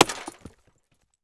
Crash Glass Bottle Thrown At Log; crashes; AdobeCC

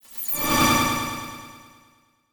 Title Transition Delay Short; Adobe; Production Element

